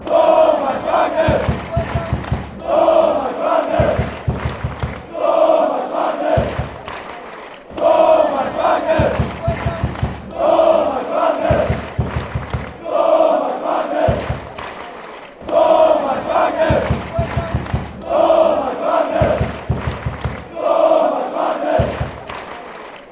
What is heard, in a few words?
fanchants, czech; tomas wagner